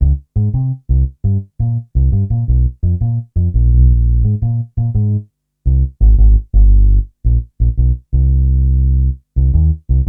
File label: Upright Bass, Drum & Bass; Fluid Dynamics